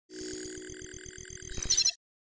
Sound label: Futuristic data